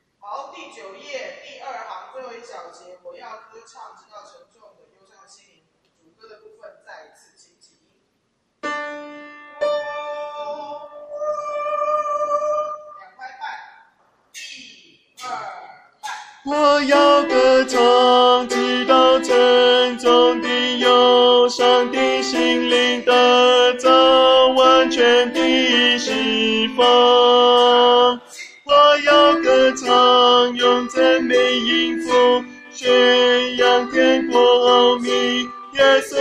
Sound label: bass